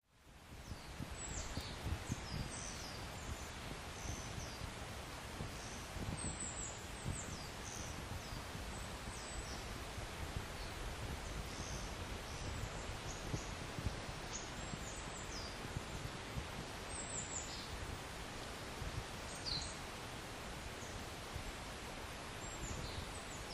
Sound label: birds